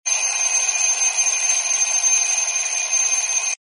alarm clock small bell

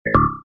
beep low metallic

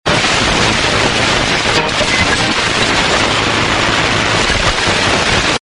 radio tuning heavy static

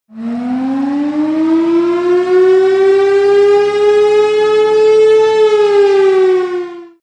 Annoying And Loud Alarm

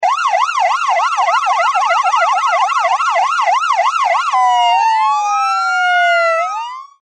Siren Alarm

